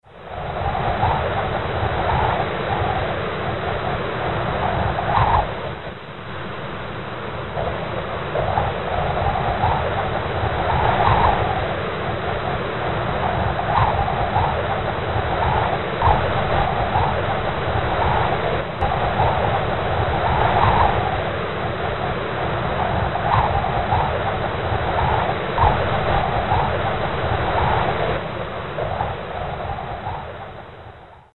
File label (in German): zu Text Suedwind, Geraeusche; Traumbilder